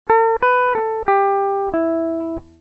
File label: lick, egitarre